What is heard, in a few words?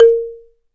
BALA, Balaphone